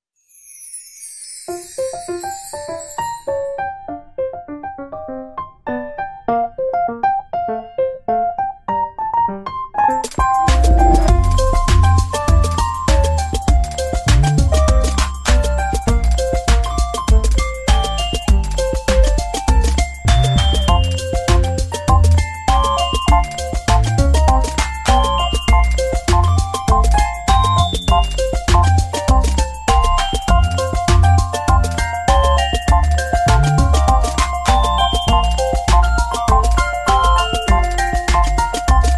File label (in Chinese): 可愛 活潑 旋律 Vlog 音樂 BGM 背景音樂 開心